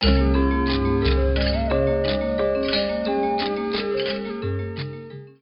musique chine

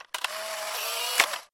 polaroid rapide

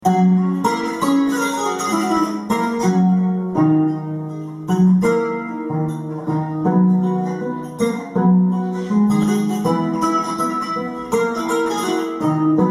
kora court

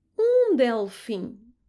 dauphin

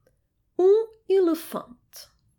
elephant

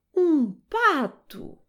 canard

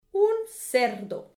cochon

